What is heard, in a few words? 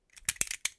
Metal Flick